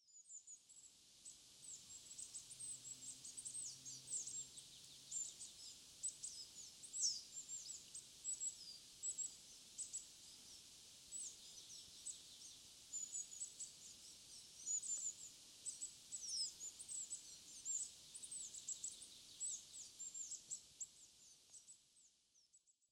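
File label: Coal Tit; higara; sapporo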